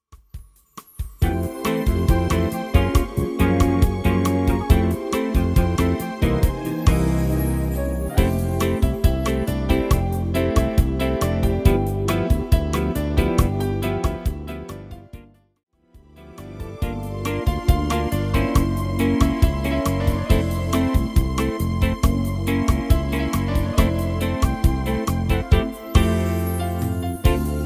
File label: Dinner Music Bossa Nova